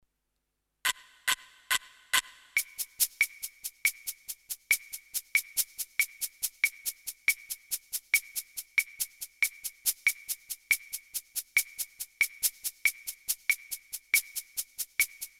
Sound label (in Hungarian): bossa nova kiseret alap, gitár